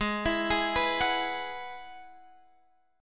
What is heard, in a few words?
Ab Minor 7th Sharp 5th Ab, Guitar Standard; arpeggiated; JGuitar